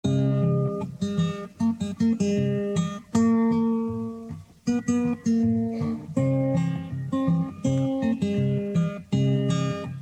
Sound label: Chicken blues